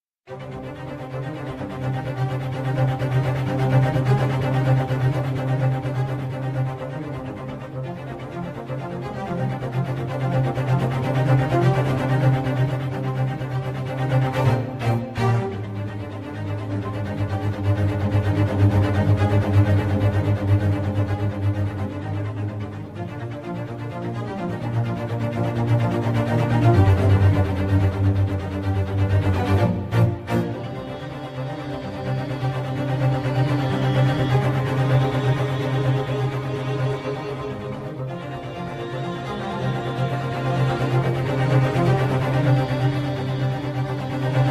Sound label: suspense (variation)